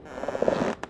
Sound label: creak, strider; hl2